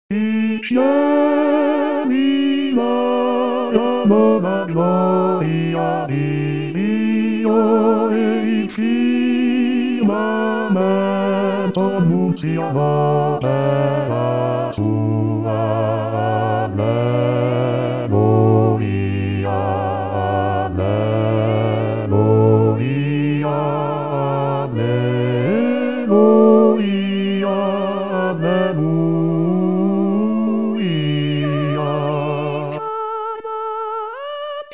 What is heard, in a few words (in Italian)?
bassi